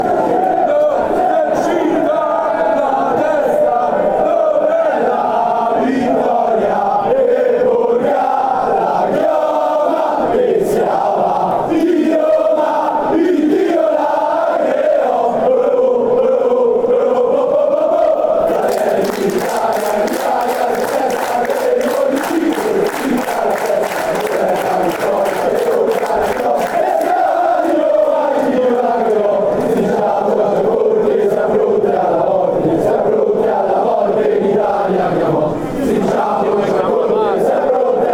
Italy national anthem

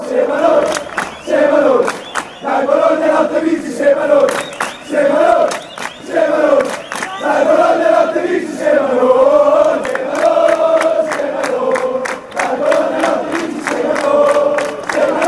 with us, fan chants

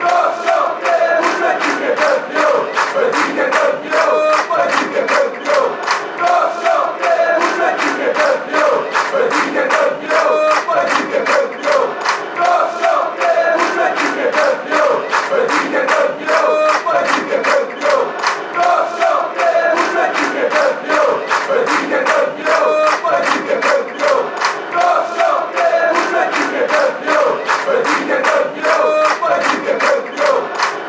benfica champion, chants

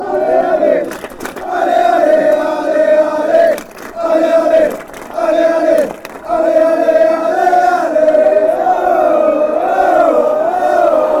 ale, fan chants

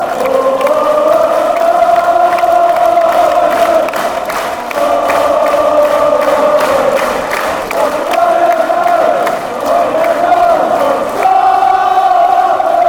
oh scotland, chants

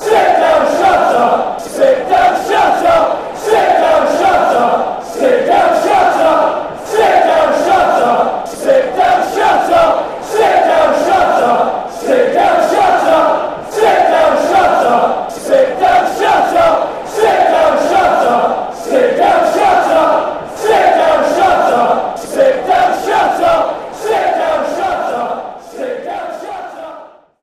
chants; sit down shut wfc

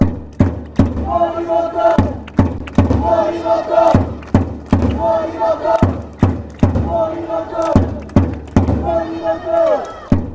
japanese chant

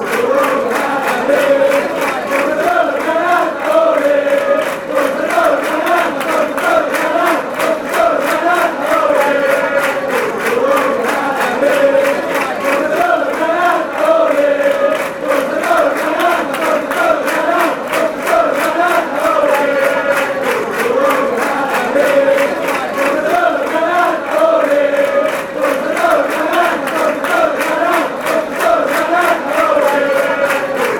ole, fanchants

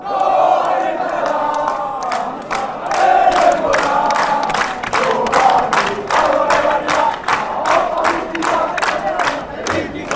atletico bilbao chant

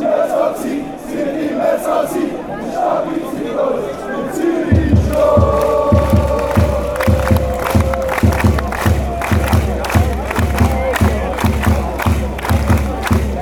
clapping, chants; zurich

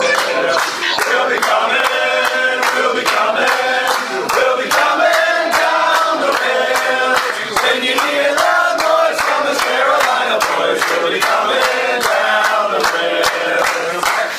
coming down the rails, chants; Sung after a Union goal

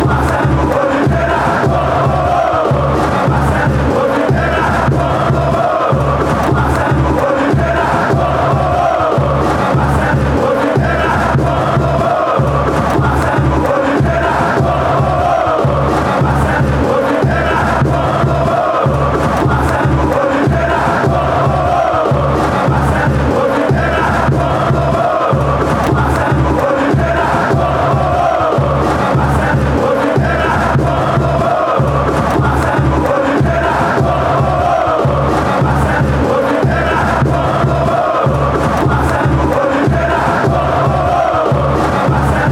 oh oh oh oh oh, fan chants; marcelo oliveira

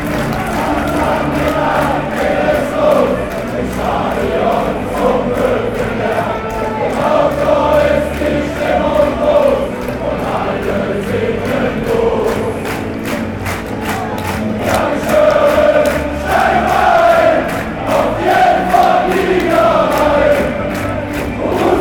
chant; b moenchengladbach

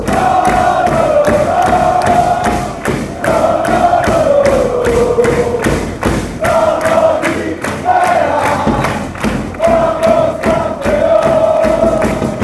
come on champ, chants

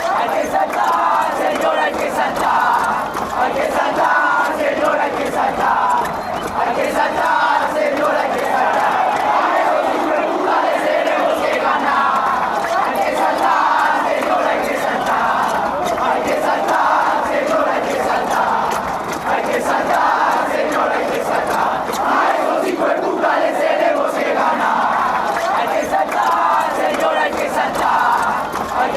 hay que saltar fanchants

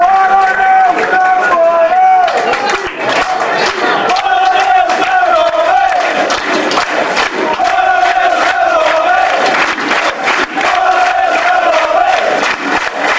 barmy army, chants; martin oneills

